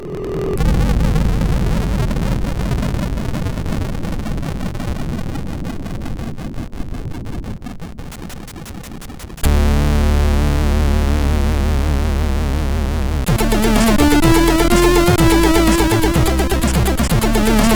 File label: sid, MOS6581R4